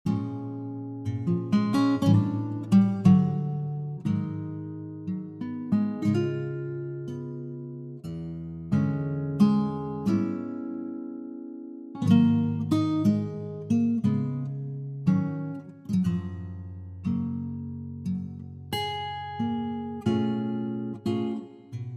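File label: Gb Acoustic Guitar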